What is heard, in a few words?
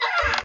Squeek; xmoto